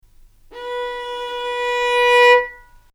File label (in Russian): skripka dlinnij usilivayushijsya